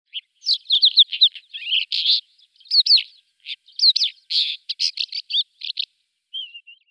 Isabellsteinschmaetzer Oenanthe isabellina; Vogelstimmen

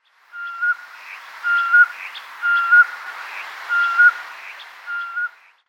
Trauerente Melanitta nigra; Vogelstimmen

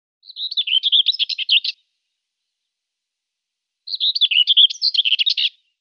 Dorngrasmuecke Sylvia communis; Vogelstimmen

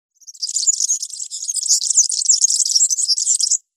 Girlitz Serinus serinus; Vogelstimmen

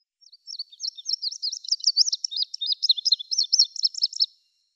Indigofink Passerina cyanea; Vogelstimmen

